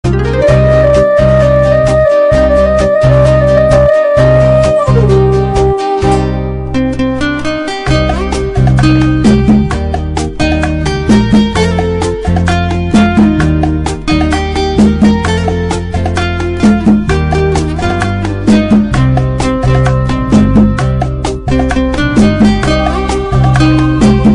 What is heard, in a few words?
guitar